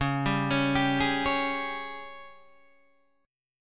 G sharp Major 7th Suspended 4th, C sharp

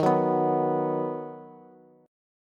Eb Minor Sharp 5th, Bass; Ebm#5 strummed; JGuitar

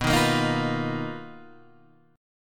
B Major Sharp 11th, Guitar, DADGAD

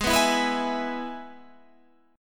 G sharp Minor Sharp 5th, Guitar, Standard; G#m#5 strummed; JGuitar

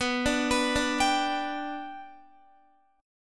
B Minor Sharp 5th, Guitar; arpeggiated; JGuitar

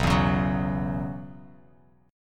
B Suspended 2nd Suspended 4th, Bass Standard; strummed; JGuitar

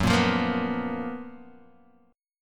Gb Major 11th; GbM11 strummed; JGuitar

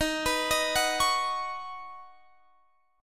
D sharp Minor 7th Sharp 5th, Guitar, Standard; arpeggiated; JGuitar

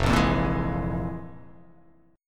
F Minor Major 7th Double Flat 5th, chord; 1,1,3,3,4,3,x,x; down; chord shape

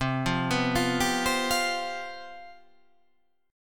C Major 7th Suspended 4th

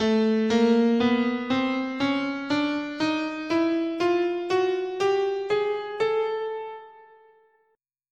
A Chromatic, scale

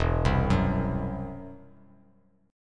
E Suspended 2nd Sharp 5th, Bass Standard; Esus2#5 arpeggiated; 0,3,4,x

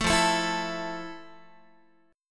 F Major 7th F, Guitar; strummed

